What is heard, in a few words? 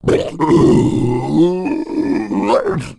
zombie idle